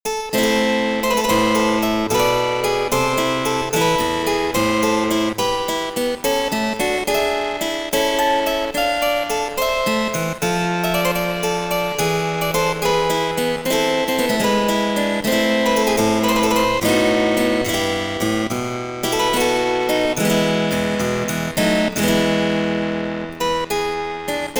harp distortion; antiquing

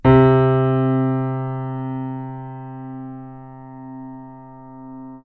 C3 beated

